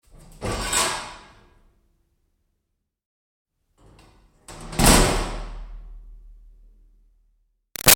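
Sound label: Door Metal Milking Vat Open Close; Metal Doors; Hollow; Big Room Sound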